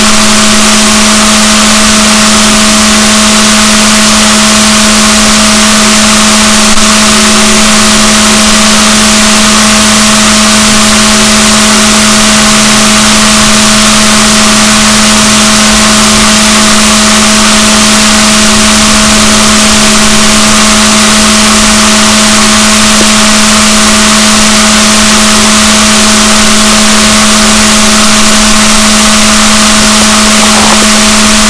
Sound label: rorquals negatif, gaussian noise